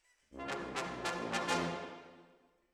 Decca, Jazz for piano

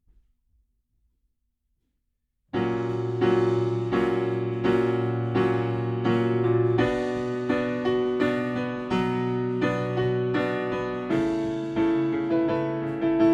Piano Low

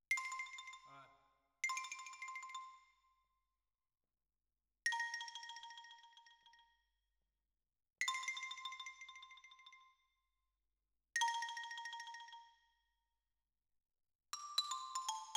Xylo

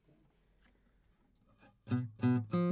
GTR

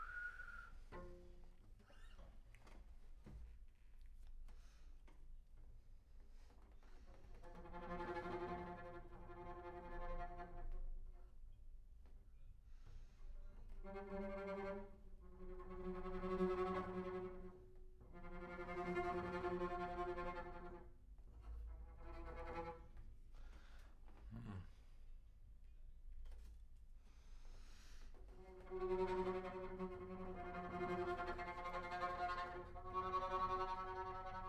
Bass Room

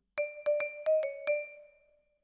Vibra Close

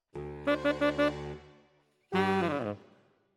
Tenor, RNR for piano

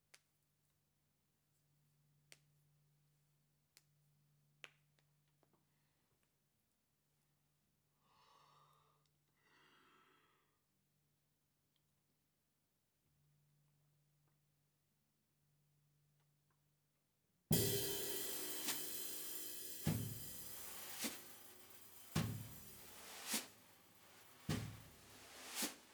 ride

